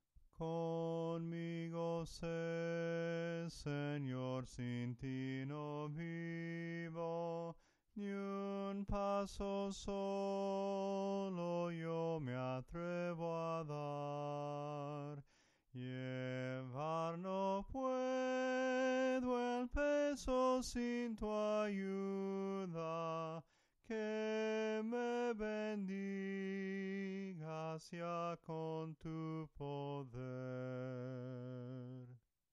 CONMIGO SE SOPRANO CANTADO; cantos del camino

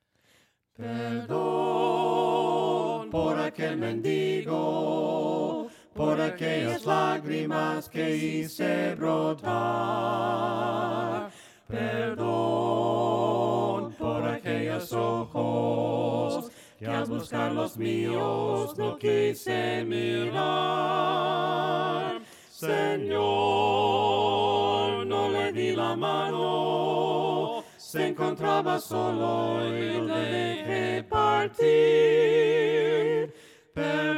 CANCION DEL PERDON VOCES, himnos a capella; cantos del camino

